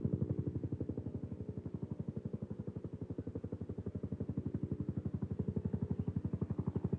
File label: Helicopter